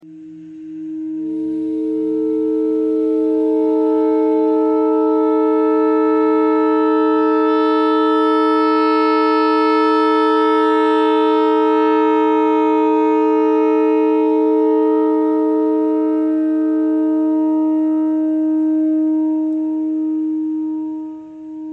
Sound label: clarinet notes e thread noise